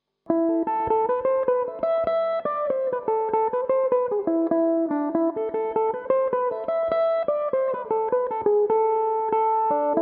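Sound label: jig A minor, Irish mandolin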